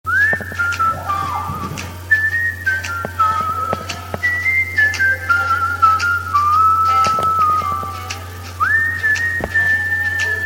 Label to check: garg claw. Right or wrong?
Wrong